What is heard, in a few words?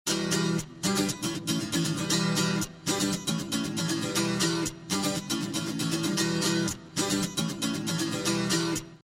bomb ten sec count; music kits custom